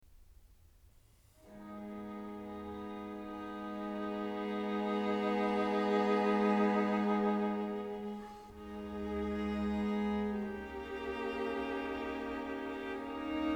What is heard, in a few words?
String Quartet in C sharp minor, Adagio quasi un poco; String Quartet No.14; Op.131; andante; Ludwig van Beethoven